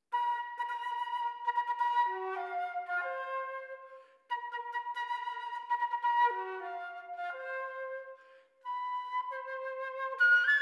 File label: Fanfare